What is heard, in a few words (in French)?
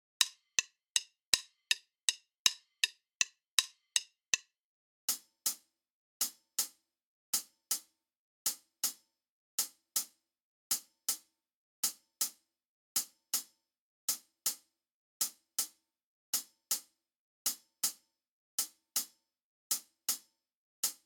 decalage triolets exercice batterie tempo 080